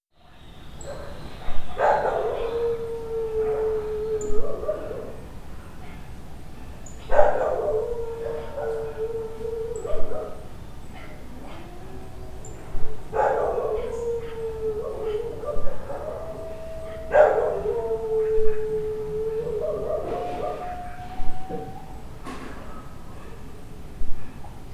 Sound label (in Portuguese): uivo solo bando ao longe